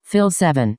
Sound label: Fill